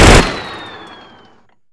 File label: shoot; darkcity; minigun